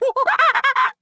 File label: confirm; Saitam